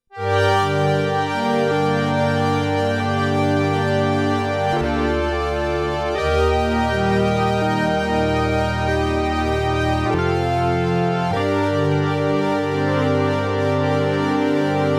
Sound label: pad